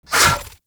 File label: Disarm Dart Shot; Warframe; Pacifist